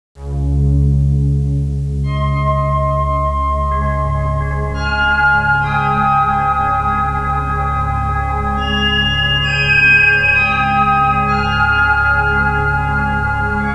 musica newage

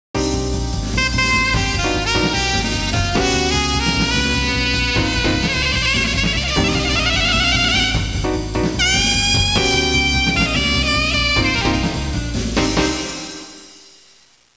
suonerie musica jazz

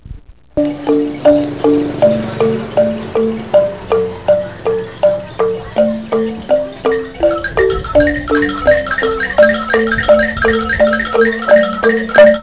suonerie ambiente

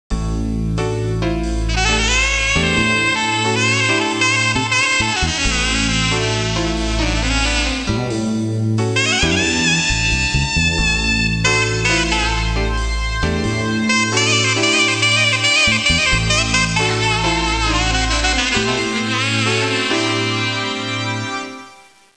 musica urban

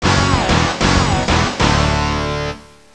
suonerie musica rock

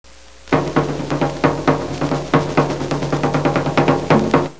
suonerie musica ritmica; rhythm

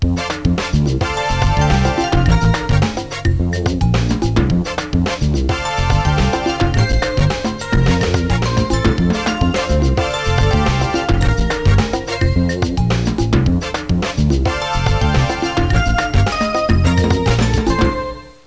suonerie musica jazz

